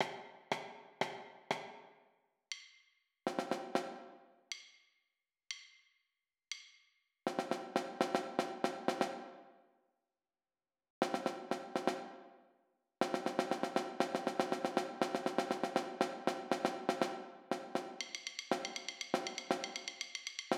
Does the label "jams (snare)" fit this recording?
Yes